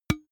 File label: big can empty hit damped soft